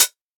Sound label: soft sounding hihat sample D key; hits one shots; hat samples